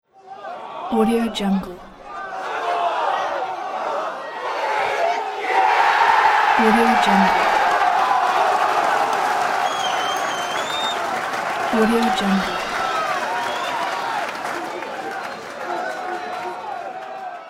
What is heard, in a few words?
goal scored soccer crowd reaction